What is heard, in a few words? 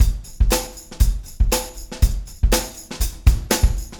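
120 beat tam; Big Fish Audio - DOM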